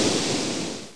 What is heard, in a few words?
BOOM FUZZ